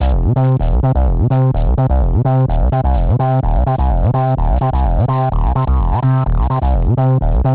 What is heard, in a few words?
hihat low